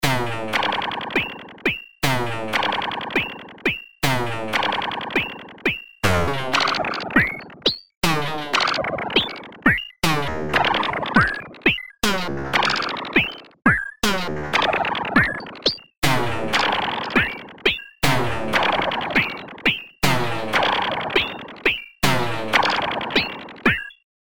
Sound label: ramdom perc